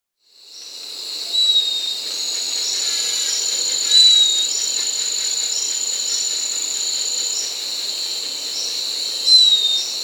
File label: Semicollared Puffbird; Malacoptila s; S. America